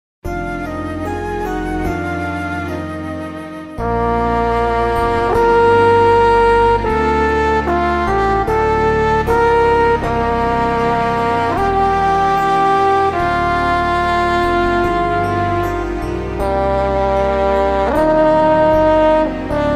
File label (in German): Horn